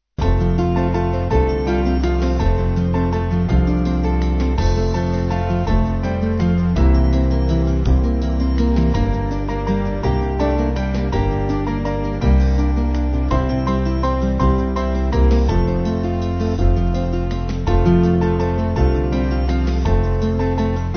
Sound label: Band; O What A Wonderful; Peterson